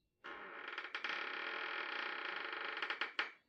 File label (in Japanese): ドアがきしむ音; door